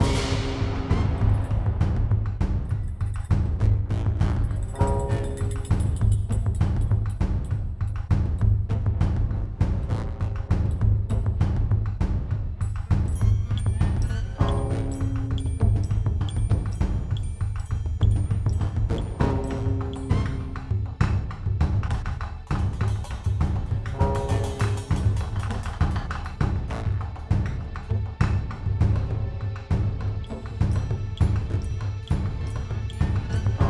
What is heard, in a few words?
Music Gameplay State Fight; Music Hideout1; H1 Level Area=Narrow Streets